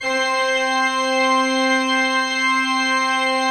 C4, Cathedral Organ; ILIO; Propeller Islands Cathedral Organ

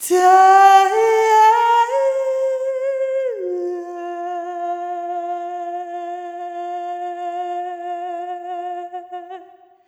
Arrhythmic F

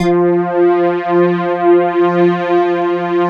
SYN JX; Best Service Gigapack 1

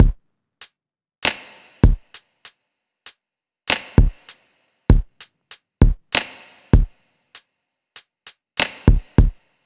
Drum Loop 98 BPM, Hip-Hop; 99Sounds Music Loops; 99Sounds x Monosounds

